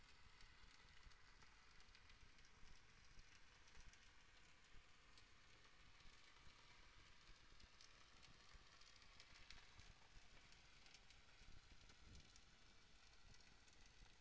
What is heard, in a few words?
HYDROPHONE 16000Hz; JASCO AMAR HYDROPHONE 740